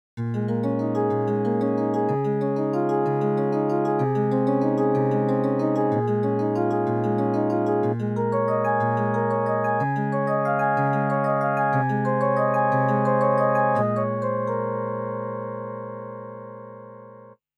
harp

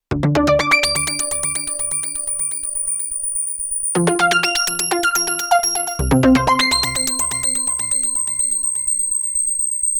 tinnitus; novation peak test

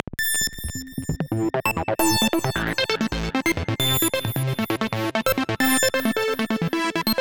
acid arpeggio

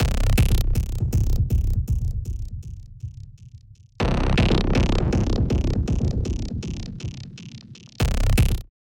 rhythmic menu set; heavyocity aeon

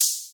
Shaker; Uppercussion Conundrums

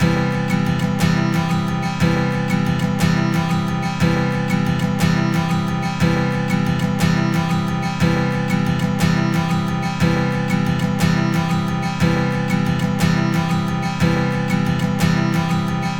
guitar rs127 box 2.7khz 6db; softube abbey road studios brilliance pack

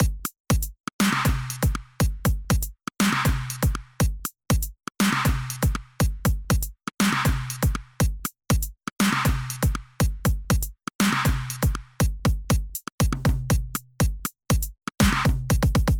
beat bypass

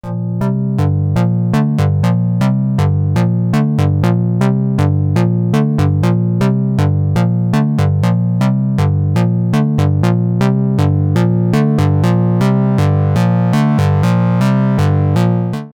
korg minilogue bass

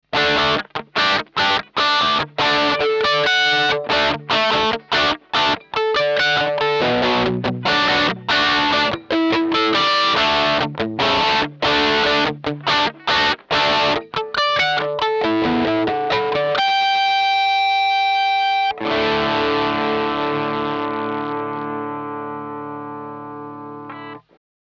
MXR EVH 5150 overdrive test

